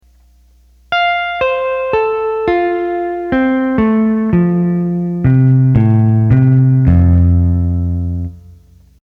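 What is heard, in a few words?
Jazz Guitar, Roland accordion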